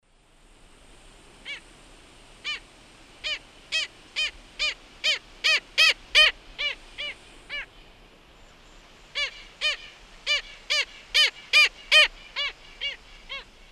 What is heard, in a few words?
Rynchops niger, flock calls; S. America; Eastern Lowlands